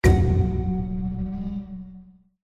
special Symbol land; Shamans Dream 2

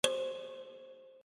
click; Shamans Dream 2

